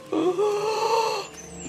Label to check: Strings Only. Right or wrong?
Wrong